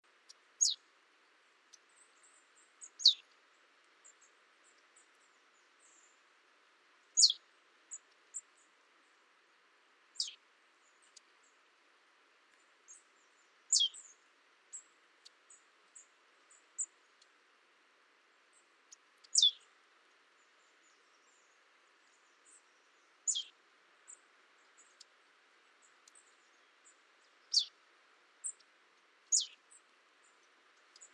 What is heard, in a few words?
Poecile palustris; SETE